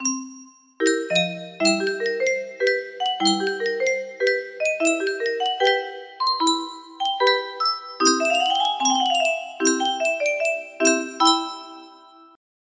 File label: music box melody